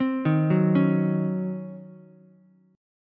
Db Major 7th Suspended 2nd Suspended 4th, Db, Ukulele; DbM7sus2sus4 arpeggiated; JGuitar